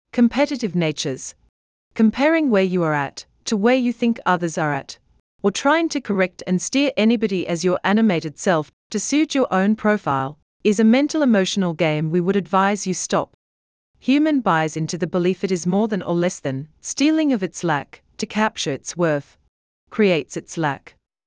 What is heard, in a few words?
G major bpm 53